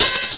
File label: hihat cab long; Amiga Special - Software Hits; orchestra; drum